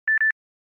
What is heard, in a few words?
samsung beep beep